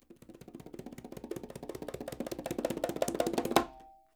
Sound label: Quinto, Percussion